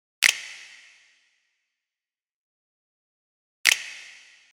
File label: Snap Wet